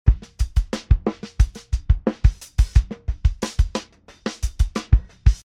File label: drums phase tremelo lr